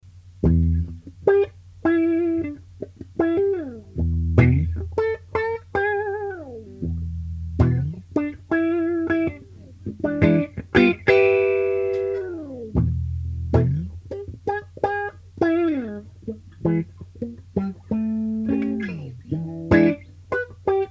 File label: Nurse-Quacky; circuit demos